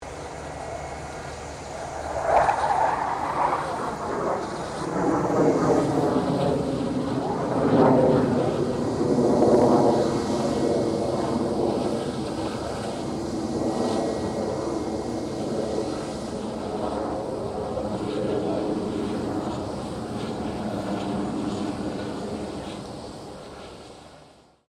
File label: 1997 BAE HAWK 127 PASS; Airplanes; Sound Effects:Vehicles